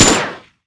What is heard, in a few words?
Coin Gun; Princess & Conquest v0.20.06